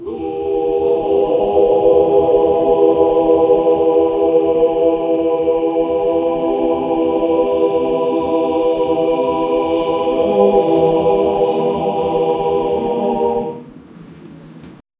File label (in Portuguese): chuva no telhado de uma